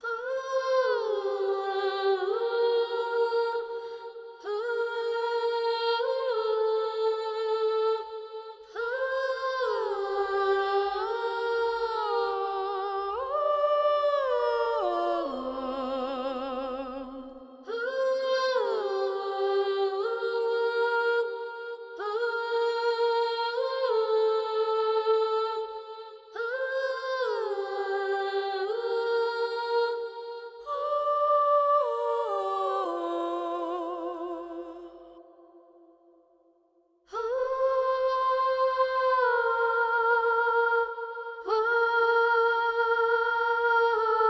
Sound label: Harpy Song Vocal only